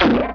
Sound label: Ninja Rope Impact; Effects; worms